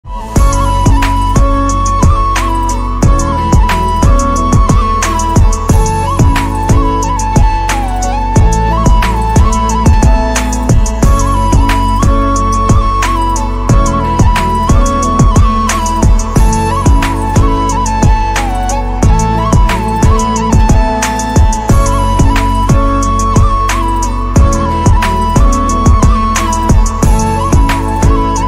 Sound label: music from east Nepal ringtone Nepal song ringtone